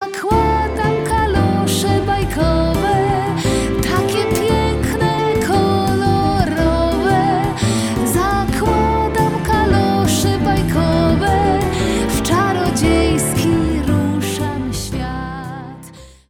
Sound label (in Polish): wokal